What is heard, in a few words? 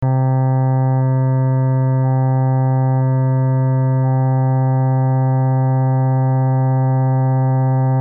cello